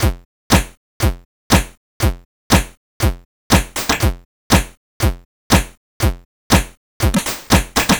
C64 Beat, chiptune drums; Commodore 64

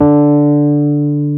WURLY D2, Electric Pianos; E-MU Formula 4000 Series Vol. 3; Analog Odyssey

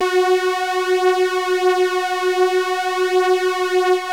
PULZE FLANG, LEADS; Masterbits; Vintage Voltage

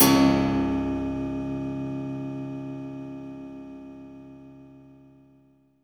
PIANO WIRE; Spectrasonic Distorted Reality 1

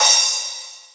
Crash, Drums Cymbal; Best Service; House Definition VOL-1; Cymbal Stuff

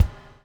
BUBL; Spectrasonics - Backbeat

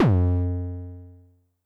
BD MOD Overdrive High, bass drum, 909; classic machines; 909mod

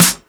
Snare; Equipped Music; Loungin House

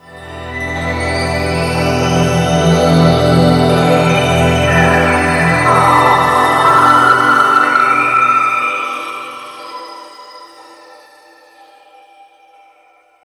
pad, Drum & Bass, 160 BPM

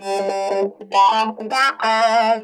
SINUS, Funk Guitar; 90 s Sample CDs; Zero G

